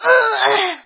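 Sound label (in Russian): ворчание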